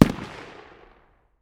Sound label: dmr medium distance, 7.62x51mm; tacz-tweak-pack; taczmechanics